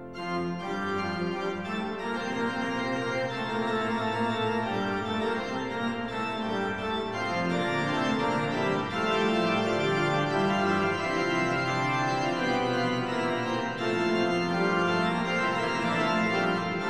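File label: Fugue, in F major, organ; J. S. Bach; Piet Kee Plays Bach, Volume 3; Eight (short) Preludes and Fuges; No. 4 in F major (second version), BWV 556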